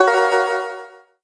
notifier bell; ZTE Open-C

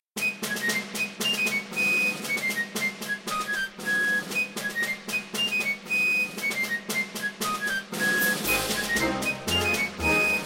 Flute March; Asus Zenfone-10; ringtones

